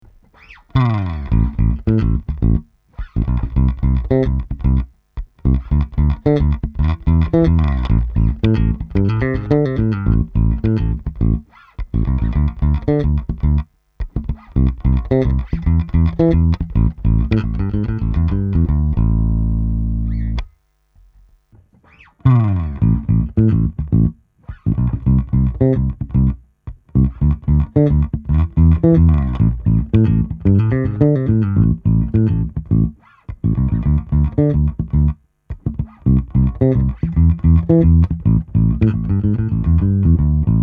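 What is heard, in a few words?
Fingerstyle, bass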